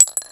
ammo casings; weapons

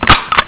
cock gun; weapons

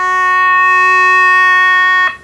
all clear, computers

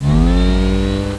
flaps up, aircraft

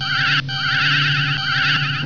laserfire, weapons

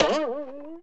twang, cartoon

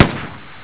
gunshot; weapons

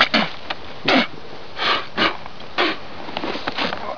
spit

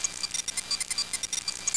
radar bleep, aircraft cockpit

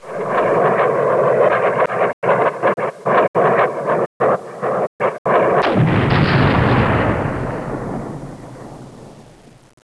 jet splutter; aircraft

